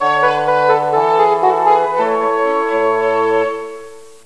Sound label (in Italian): suonerie classica; musica classical